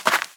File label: land ice; Scrat